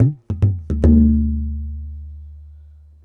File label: bass lick, tabla; Dirt-Samples